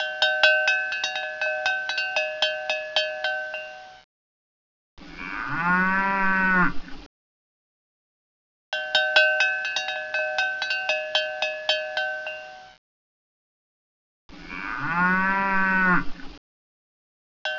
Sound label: cow and bell